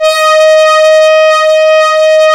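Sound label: MEMOOG BRASS; E-MU Formula 4000 Series Vol. 3; Analog Odyssey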